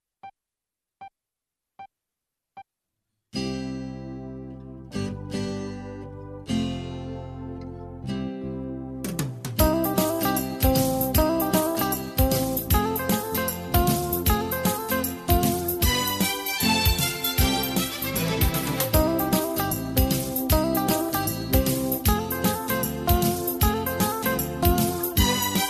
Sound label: X'an Devotional Karaoke